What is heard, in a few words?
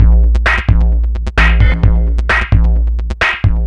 Beat (130BPM), techno; techno drum fx